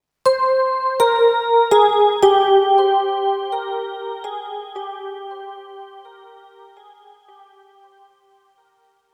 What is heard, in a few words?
Oneshot Rhodes; zombie army 4 dead war ps4 stadia switch windows xbox one gamerip 2020